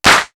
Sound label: handclap; East West - Global Sounds